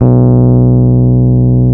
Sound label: electric pianos; Wizoo Electric Pianos